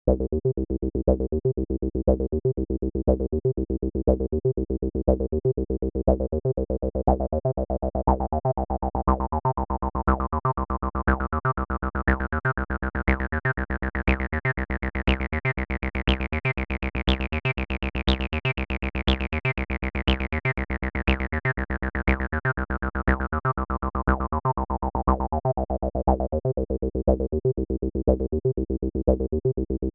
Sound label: ACID V Square; ARTURIA